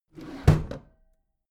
packed drawer smooth close heavy halfway

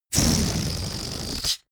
balloon air release fart

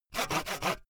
sawing small

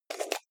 jar plastic midsize open fast

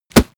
punch mid tight

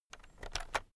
keyboard external shortcut medium

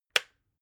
water boiler switch off

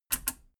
metal button radio click power on fast

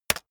keyboard external space hit very fast

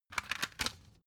cd cover close soft

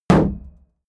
shield sheet impact dent

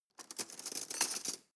cutlery teaspoon drawer handle

